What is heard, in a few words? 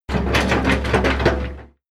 Heavy Metal Rattle, Shaking a large metal object, heavy metallic rattlin; Heavy Machinery; heavy metallic rattling and clanking; Machinery Sound Effects